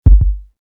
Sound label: stop; slotssister